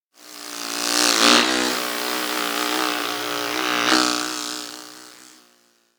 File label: dragonfly fly; anemoi